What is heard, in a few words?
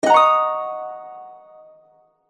Glissful Message, Harp; HTC; Harp 1